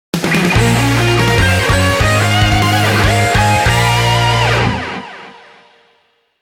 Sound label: Victory